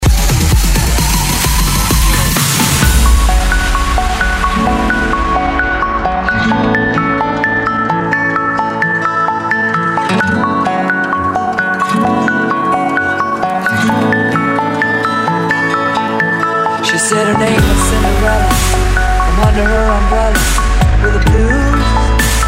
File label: electro house